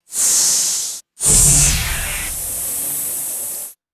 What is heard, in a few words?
synthesized sci fi energy field burst; magic scifi